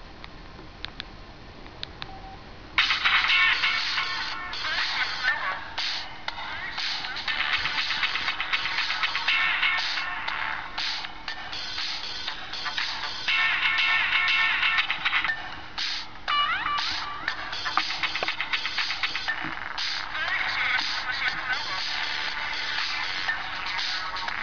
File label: ringtone